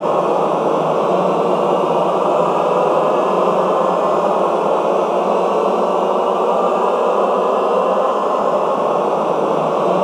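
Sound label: Glissando ENDL, Classical Choir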